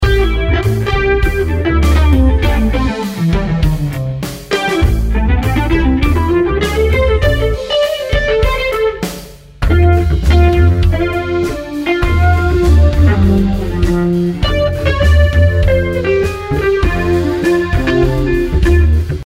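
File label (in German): ausgeschaltetem Reel ADT, Gitarre, Bass; Waves Abbey Road Studios Reel ADT; Waves Eddie Kramers GT Stereo; Eventides UltraReverb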